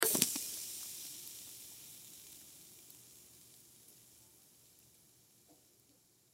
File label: Sterno Extinguish